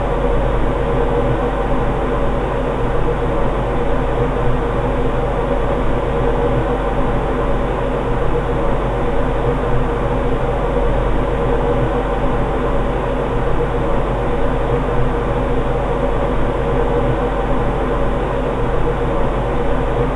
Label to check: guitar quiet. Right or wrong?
Wrong